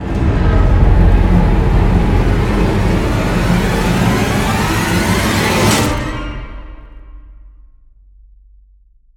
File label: Stinger